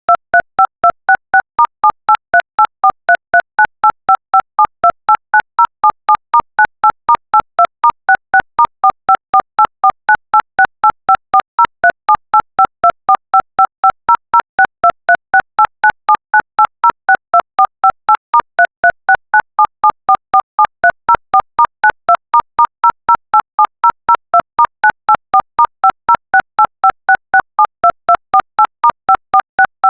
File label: tone